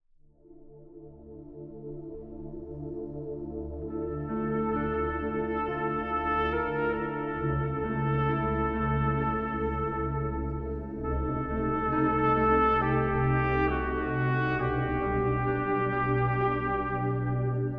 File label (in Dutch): trompetsolo